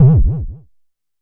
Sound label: Jomox MBase, drum machine; Jomox MBase 01